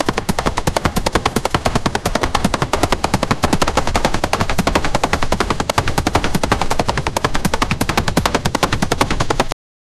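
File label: many army advance footsteps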